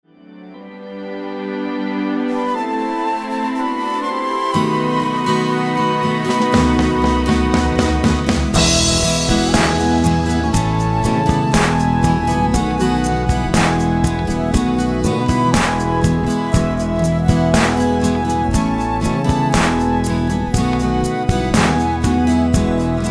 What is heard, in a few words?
Responsorial Psalm instrumental; for Easter Sunday This Is The Day